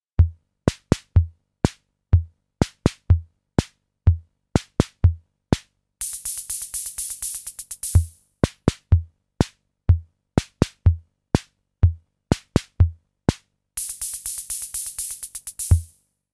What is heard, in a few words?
SR-88 PATTERN